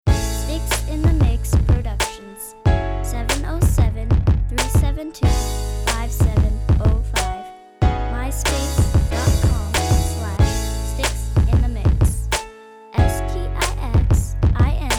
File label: piano soul